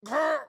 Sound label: AHurt